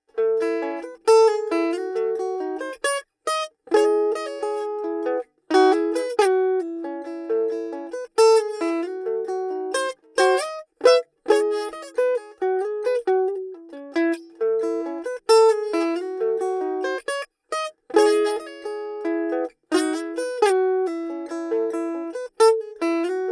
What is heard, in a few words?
Mandolin Gibson; Soundelux E47